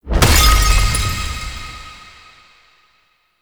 Crystal Count Threshold, UI; Citrines Last Wish

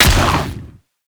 Inf Vomit Gun Frenzied Shot; Infested; Weapons

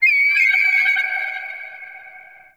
Corrupted Beach Seagull Night; Ambience

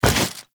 Generic Jump, Generic Foley; Warframe; Characters

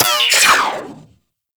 Cannon Mech; Warframe; Weapons; Archwing

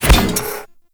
Step Default; Warframe; Corpus; Ambulas; Footsteps

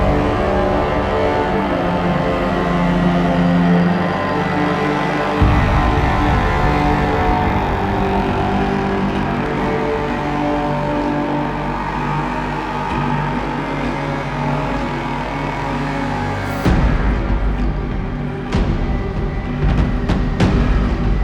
Climax Cue A; Entrati; TennoCon 2023; Cinematics

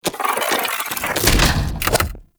Venus Unicycle Door Close

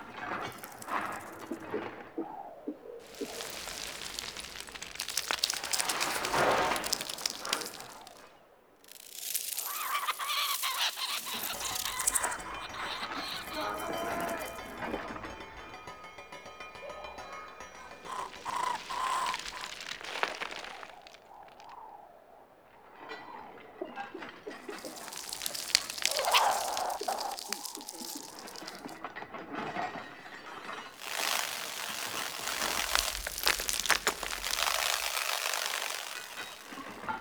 Exterior Foreground Alley Loop, ambience; Duviri

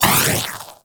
sash impact; Armillary Sash; Warframe; Nezha

